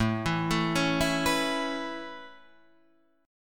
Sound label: A Major Add 9th A, chord; Aadd9 Chord {5 7 7 6 5 7} arpeggiated